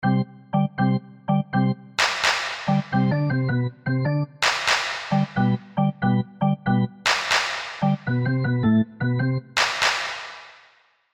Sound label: arena organ hat dance